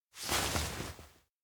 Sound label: approach; awayuki